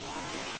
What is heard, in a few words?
cardboard slide